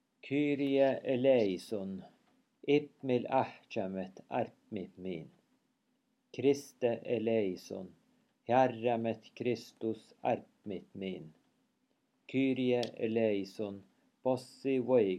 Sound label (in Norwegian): kyrie nordsamisk